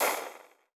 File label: Liquids honey lava